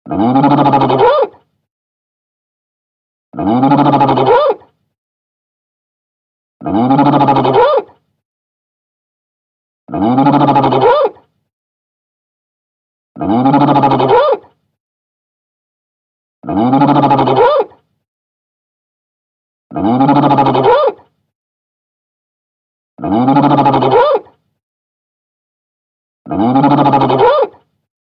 Galapagos Fur Seal

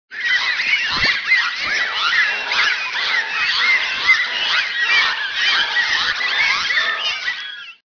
bottlenose

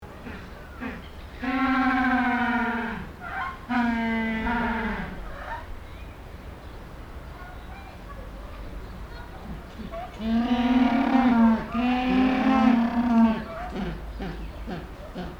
Spheniscus humboldti

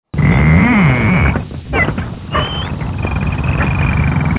animal sounds dolphin

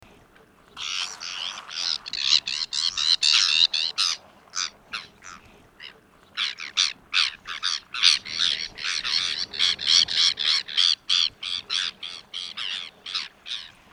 ivory gull

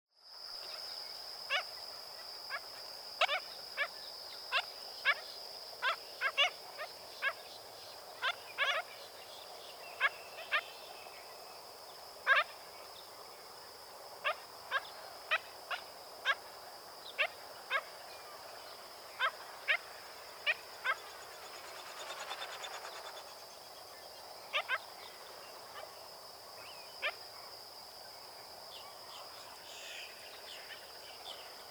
black skimmer

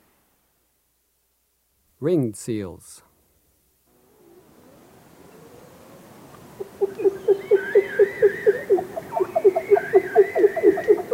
Ring Seals

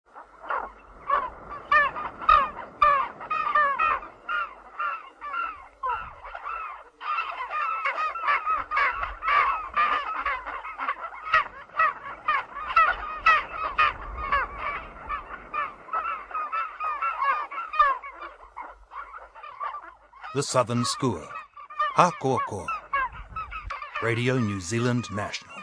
southern skua

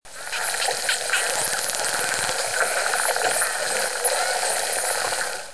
tucuxi

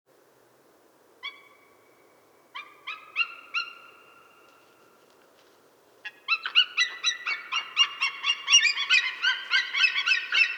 sea eagle

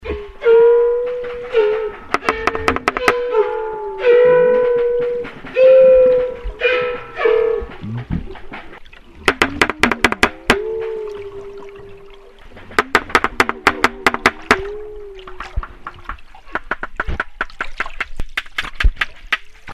walrus knocks

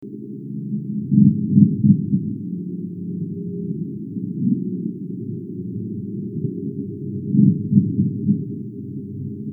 hemisquilla

